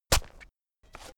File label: digging